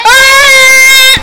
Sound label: yaaahh